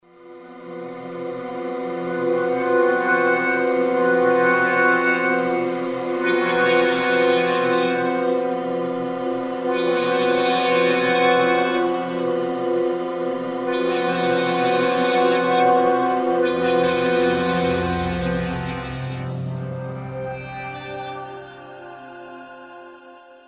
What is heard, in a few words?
Inertia, Roland JV-2080